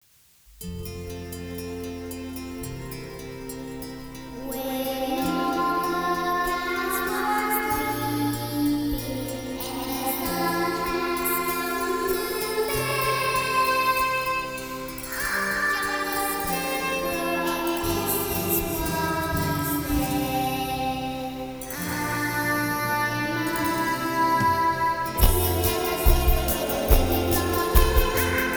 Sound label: Nursery Rhyme